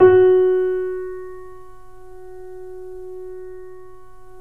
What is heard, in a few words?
F#, 3D Pianos, Boesendorfer; E-MU Producer Series Vol. 5; 3-D Audio Collection